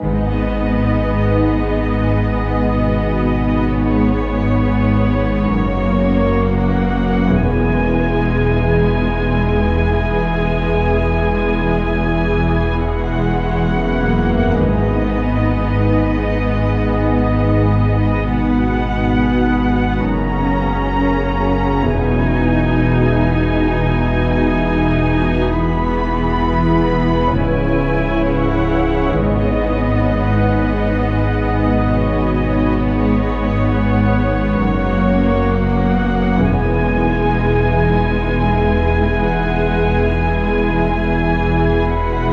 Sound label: Orch Ambient Sorrow; Orchestral Ambient Music Pack